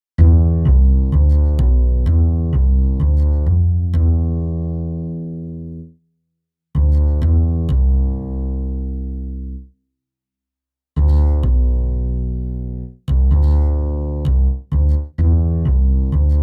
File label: Bass bounce; Przepowiednia Outro